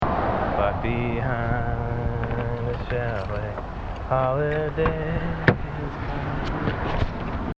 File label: pulling